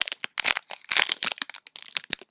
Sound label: open